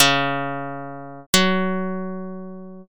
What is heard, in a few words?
biwa